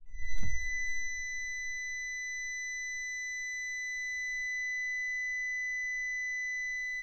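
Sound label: CAR Alarm, Light On, Nissan Note, Interior; Japanese Compact Car; Nissan Versa Note; Articulated Sounds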